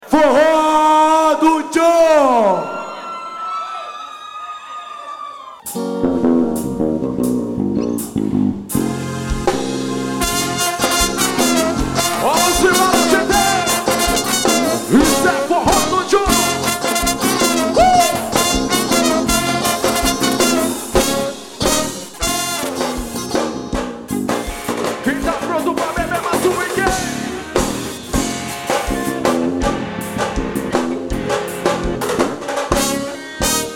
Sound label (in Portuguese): forró